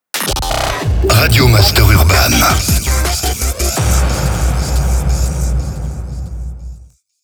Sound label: JINGLE RADIO; RADIO MASTER URBAN; Habillage